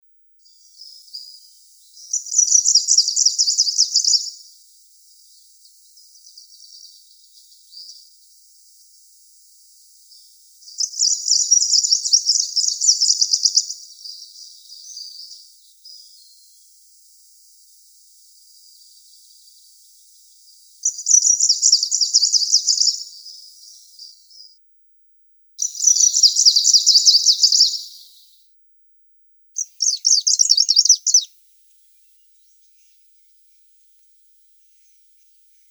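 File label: Penochka zelenaja, greenish warbler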